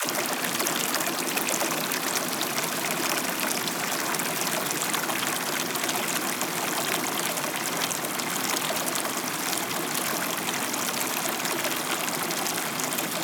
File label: Foley Water; Loops; Ghosthack - Color Bass Essentials